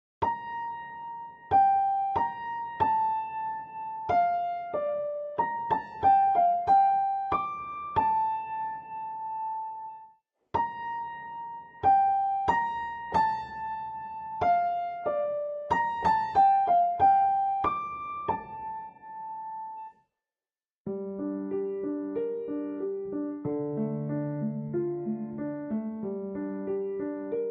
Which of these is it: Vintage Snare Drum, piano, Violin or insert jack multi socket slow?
piano